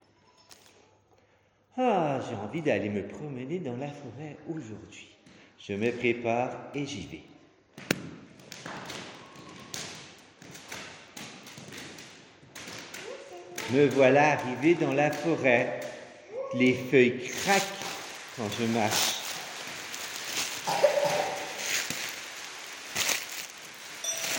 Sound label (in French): bruitages, l'automne; eveil musicale